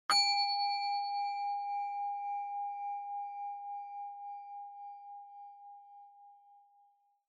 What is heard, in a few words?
Ding Low Long; Onoma